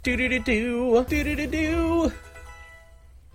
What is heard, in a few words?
dudududu